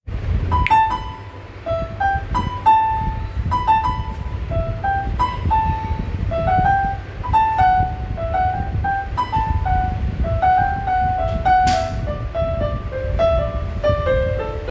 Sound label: music piano chill piano cover